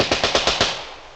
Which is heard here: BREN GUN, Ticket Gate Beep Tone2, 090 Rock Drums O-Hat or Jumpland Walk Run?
BREN GUN